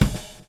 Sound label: groove; AMG Gota Yashiki Groove Activator